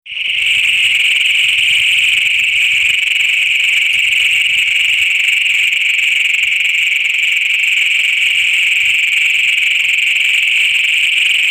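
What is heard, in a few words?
bcognatus chorus, frogs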